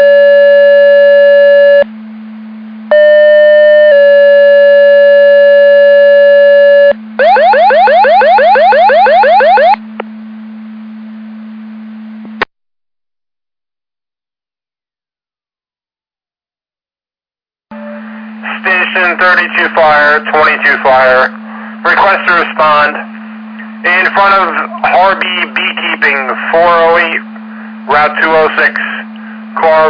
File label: Siren; Andover Twp Boro